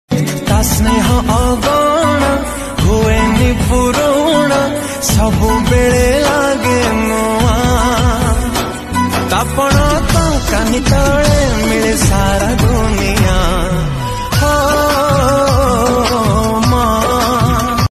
odia new ringtone

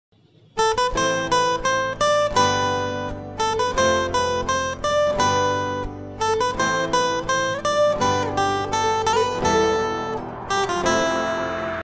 single string guitar; guitar tabs

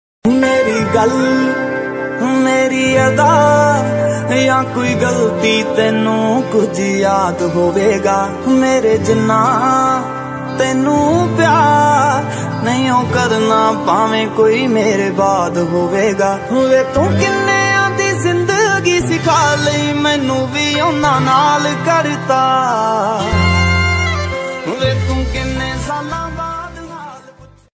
new Punjabi ringtone

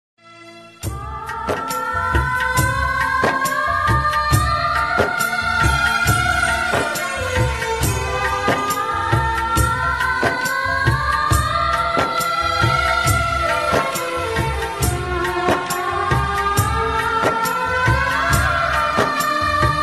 ringtone

